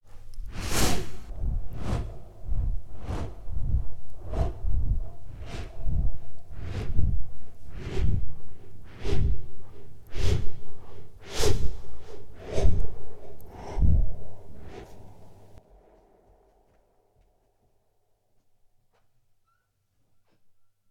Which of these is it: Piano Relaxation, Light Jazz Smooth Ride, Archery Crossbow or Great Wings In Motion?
Great Wings In Motion